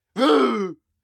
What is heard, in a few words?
Attack; False Knight